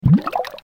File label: Nature